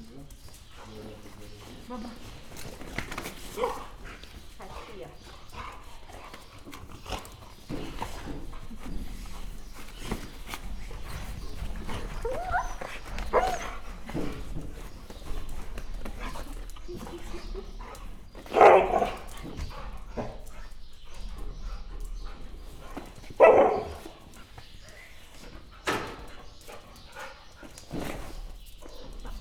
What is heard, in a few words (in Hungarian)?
ólból kiengedve, hortobágyi sinka; hortobágyi nemzeti park 2013